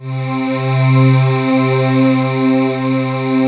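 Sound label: vox whisper C4; FAIRSWEEP; Synth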